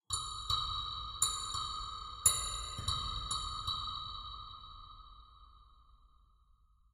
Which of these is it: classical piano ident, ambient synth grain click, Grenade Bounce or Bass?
ambient synth grain click